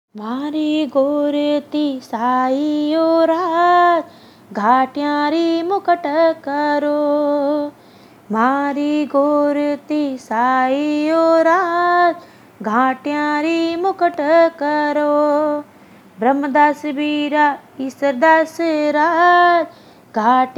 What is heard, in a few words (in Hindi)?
गोर ती साईं, पानी प्यान का गीत; गनगोर गीत